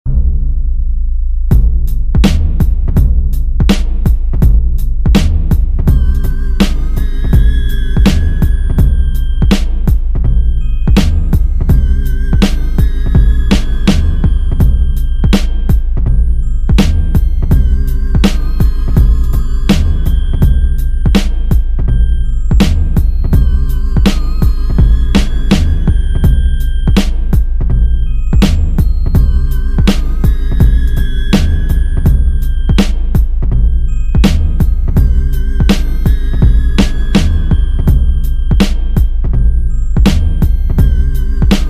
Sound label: shuffle; robitussin